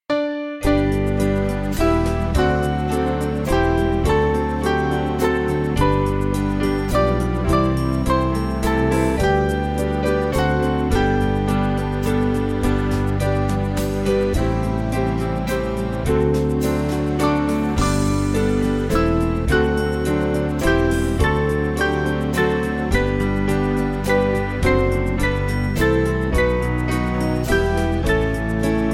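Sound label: Music Backing Files for Churches; I Know Not How; Harper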